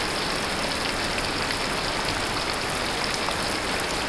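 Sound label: heavy water; PC Gamer IT CD 49; UrbanChaos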